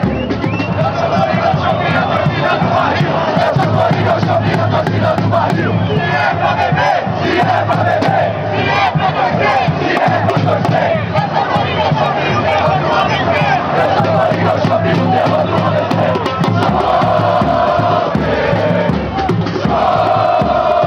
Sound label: coringao chopp chant